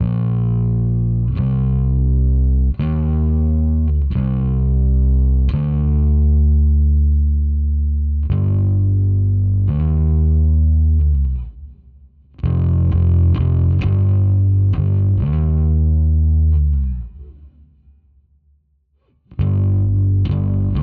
Layer Bass; The Binding of Isaac Repentance; Genesis II Reverse Caves Layer (Bass)

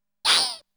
Hit; Namco Game Sound Express Vol.1; Valkyrie no Densetsu

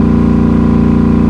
Aston Martin Vantage Low; car simulator 2

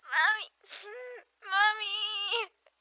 Girl Idle; In the Line of Duty Firefighter

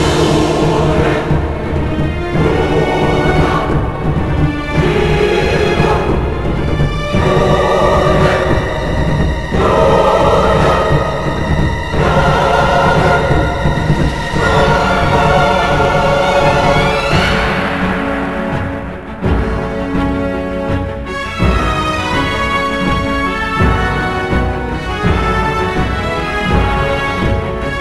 Music Combat; game rip; Legend of the Guardians The Owls of Ga'Hoole; nds

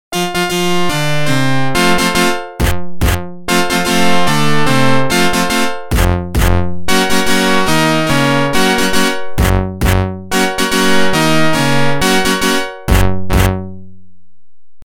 Organ; NHL 94

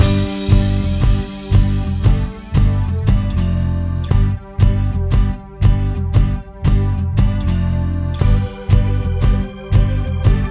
Rock Loop; Quirkee; Asguaard; RPG Maker

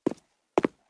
Footsteps Rock; Idle Champions of the Forgotten Realms

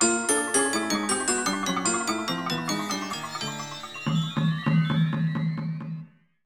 BGM Jingle Lose; lucky luke the daltons; nds

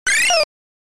Pumping; Dig Dug; arcade

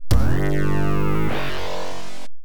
Traffic Light Change, pinball; Getaway: The High Speed II; Williams; arcade

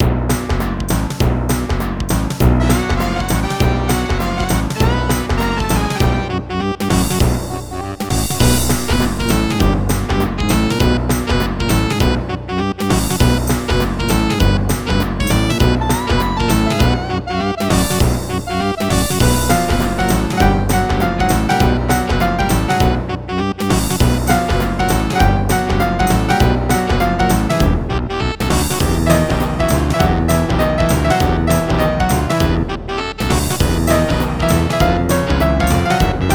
Circus Jazz; M&M's Lost Formulas Remastered

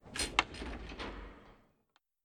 ladder move; Kismet

